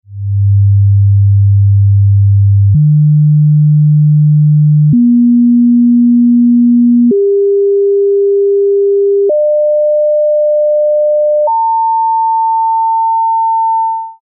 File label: Sine Waves; ragajunglism